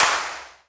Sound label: clap, hip; Best Service; Dance Mega Drums